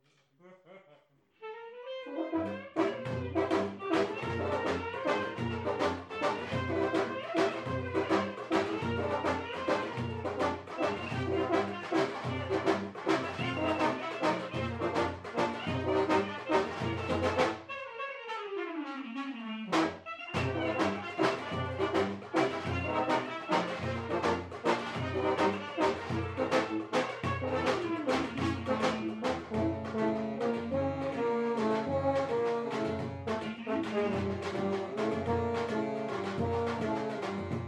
shuffle end rehearsal

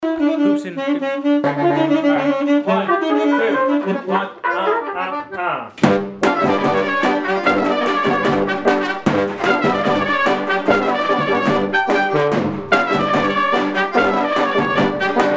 solo transition rehearsal

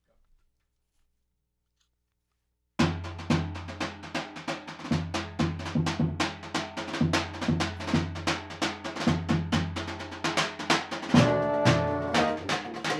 clarinet mic

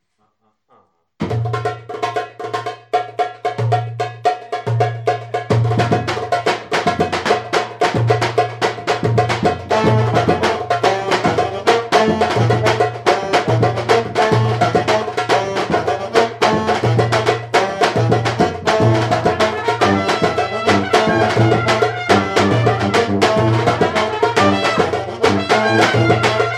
thailand shuffle rhythm rehearsal

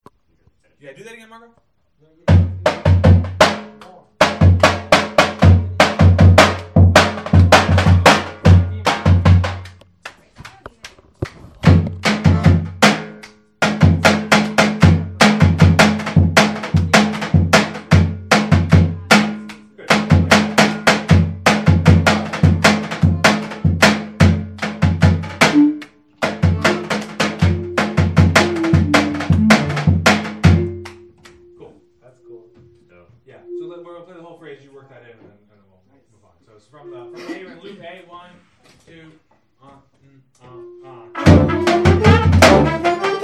drum hits, rehearsal

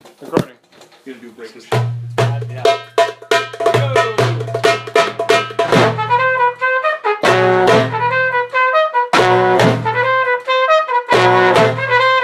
Medley 9 8 Breaks, rehearsal

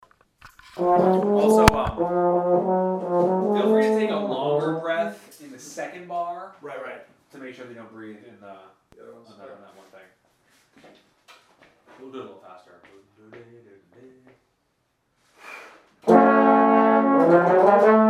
midhorns

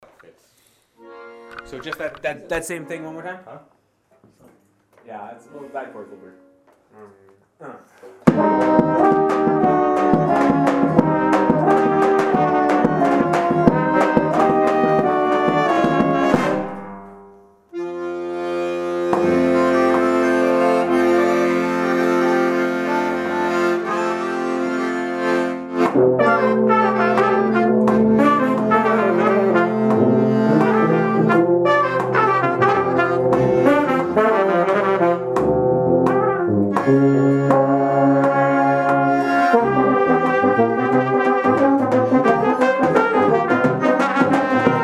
balkan bollywood rehearsal

